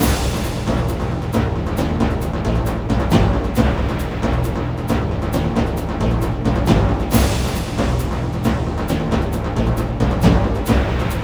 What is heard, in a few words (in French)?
Rythme; Odyssey The Search for Ulysses